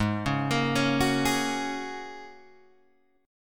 Ab 7th Suspended 2nd Suspended 4th, Guitar, Open G